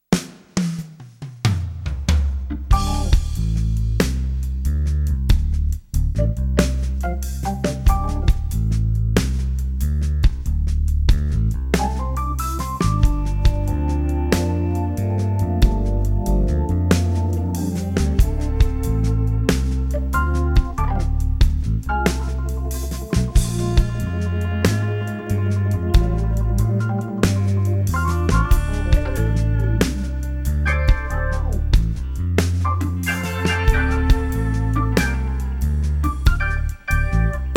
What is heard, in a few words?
groove